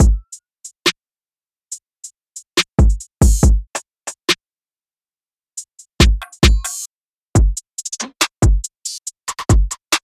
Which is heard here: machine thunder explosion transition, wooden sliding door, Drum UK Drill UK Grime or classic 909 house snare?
Drum UK Drill UK Grime